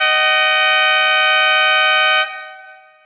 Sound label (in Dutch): Horn klein, Kermis Horns